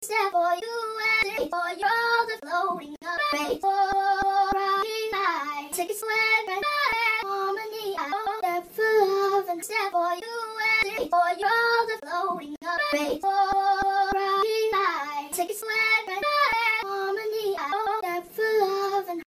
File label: Child Vocal Chops Dry